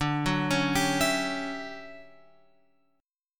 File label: D Major 11th, chord